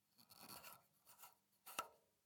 Schneiden

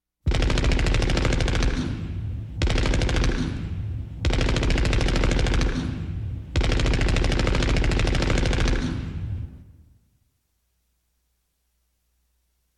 Waffen Maschinengewehr Kaliber 30

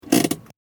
Auto Handbremse anziehen

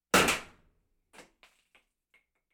Bei Tisch Knallender Korken

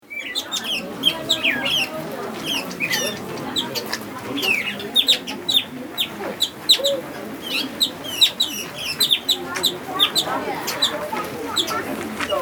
Voegel und Menschen

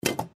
Deckel

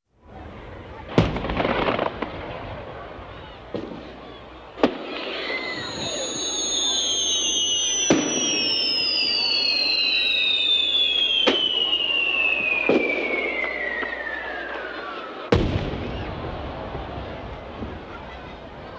Feste Feiern Grosses Feuerwerk

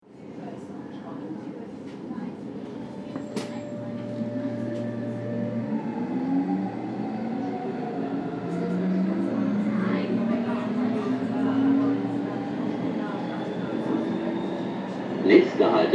S Bahn Abfahrt u Durchsage

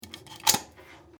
home Stecker

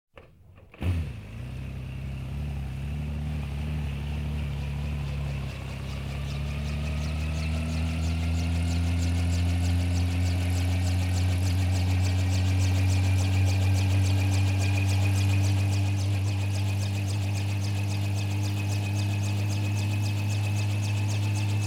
Hubschrauber startet und hebt ab; Luftwaffe

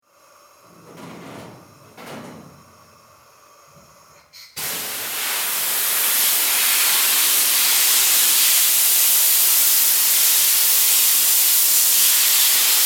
Sandstrahler

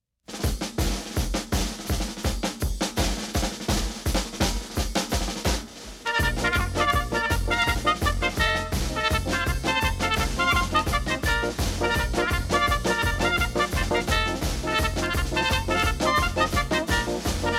Tanzrythmen Polka

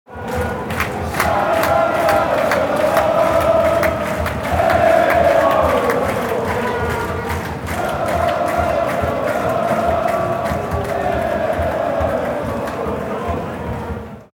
Stadion Fans und Gesaenge

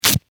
kleidung klettverschl

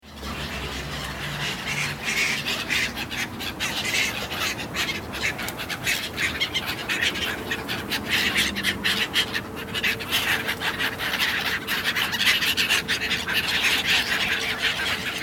Moewen und Tauben

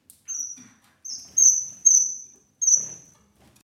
Voegel

